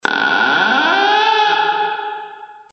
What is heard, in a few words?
door